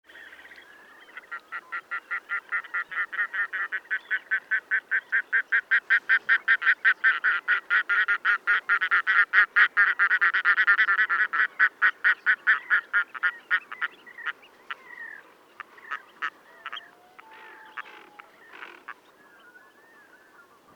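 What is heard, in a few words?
red-throated loon voice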